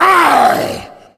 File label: tank fire; Left 4 Dead; Source Engine